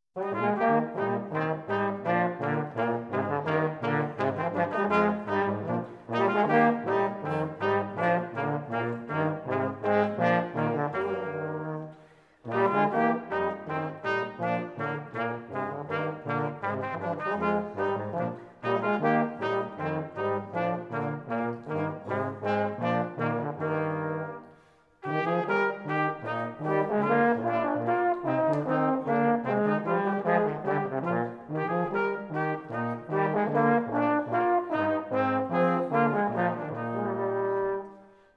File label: Trio Bourré; J. Jos. Fux, Musikschule Kölliken